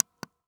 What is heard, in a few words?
Technology Electronic Camcorder Panasonic Dvx100 Buttons